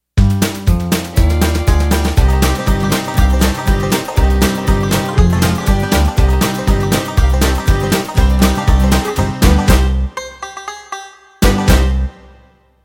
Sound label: BlueGrass End